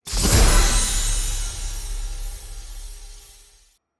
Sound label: mega Win Text Change; netent